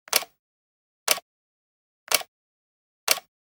Generic Click Metal Medium; boom library; The Interface

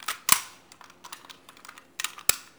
Gun Handling Weapon, Weapons Foley; SFX Kit

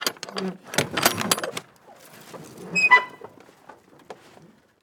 Semi Truck Trailer; Large Vehicles; Blastwave

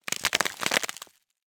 Cardboard, honeycomb, crush, collapse, short; Eiravaein Works; Vaeyan IV

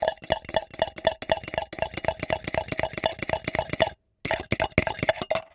spinning; best service Blue Box; mixed sounds short

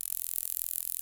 attack; Apotheorasis

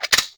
Menu Click

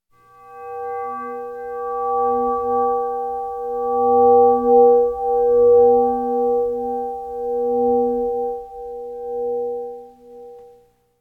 Eerie Chime Sound; horror sounds; Hollywood Edge

